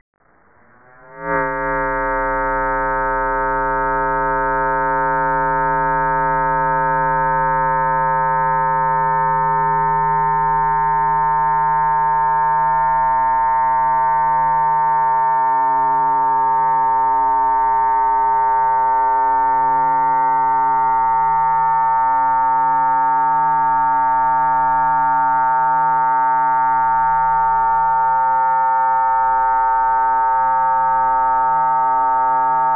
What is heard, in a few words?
Multimode Laser Left + Right Facets; Light Rock